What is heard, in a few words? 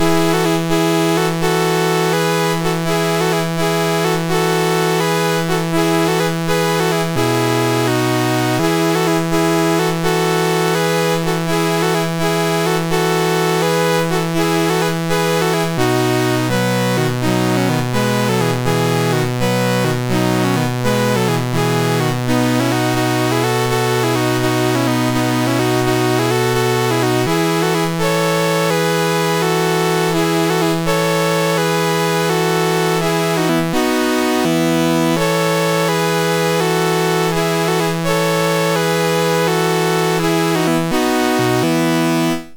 MOS6581R3, GAMES; Woodpecker